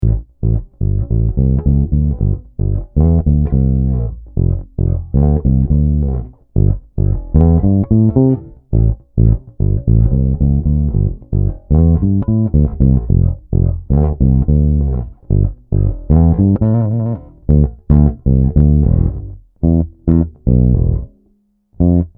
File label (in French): Did M Actif Tone Off, basses